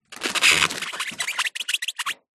vehicles bicycle wheel slow roll rub squeak concrete ground; zapsplat